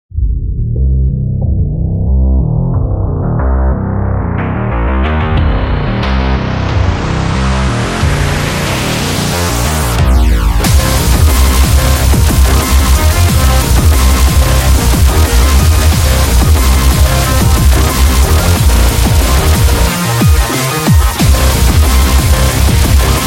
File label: Dubstep Sport